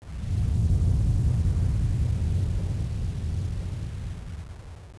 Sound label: BIO-TOXIN; Marathon revisited